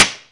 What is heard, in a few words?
Pinball Ball Hit Glass